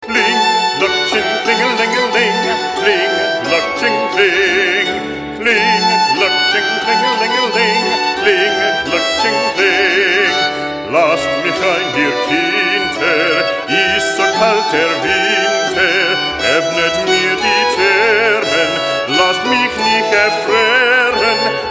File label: kling Glockchen